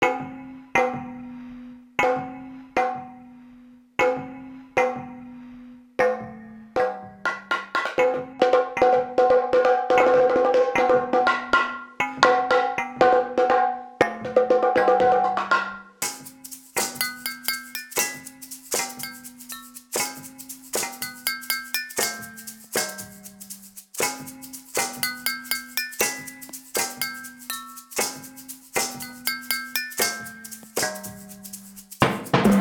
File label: Metal Marimba